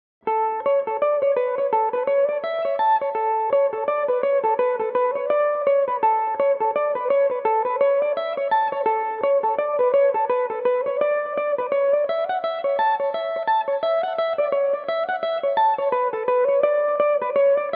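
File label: A mixolydian, irish mandolin, tunes; neilidh o boyles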